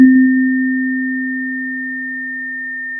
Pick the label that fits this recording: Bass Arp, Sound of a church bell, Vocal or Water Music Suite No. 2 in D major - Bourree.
Sound of a church bell